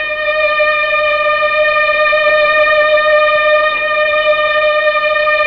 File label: STR, Melo. Strings, Slow Strings; Roland LCDP09 Keys of the 60s and 70s 1